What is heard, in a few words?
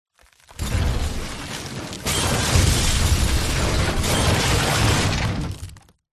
impacts building collapse more glass